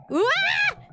Scream uwaaaah; IRyScream

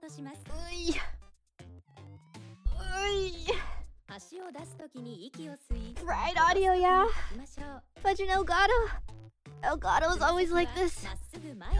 stretching moment

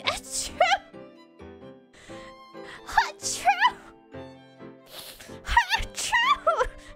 fake sneezes; noises

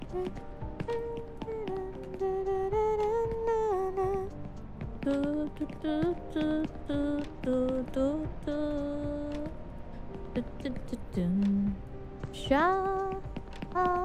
humming

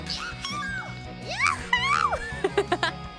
noises; Korone

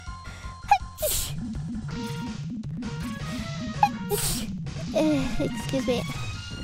Sneeze

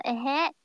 tee hee; SpaceRyS

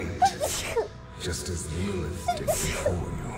Sneeze

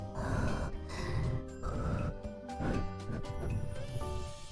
blow noises

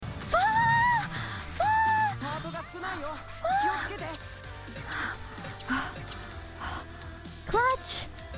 breathing

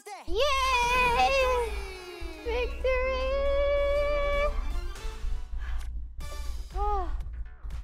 yaaaaay victory

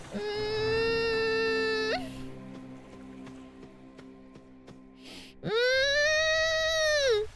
mmmmmmmmmmmmmmmm

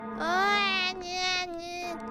hope noises

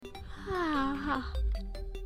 Yawn

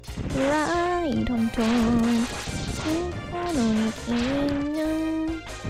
Singing

